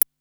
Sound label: Tick Hit, Korg Wavestation-SR Drum Kit